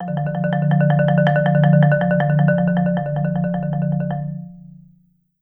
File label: F2, Marimba roll; Sampleheads - Dave Samuels Marimba & Vibes